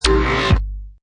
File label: Hi Tech Panel Servo Button